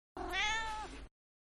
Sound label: Cat-Meow; Cats; Animals; Cat-Meow 1; Manhattan Production Music